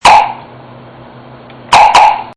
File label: chirp